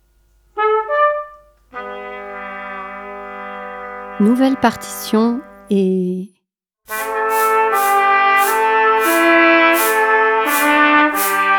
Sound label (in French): melodie de la pluie